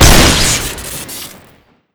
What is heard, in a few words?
bombshot shoot crit; tf2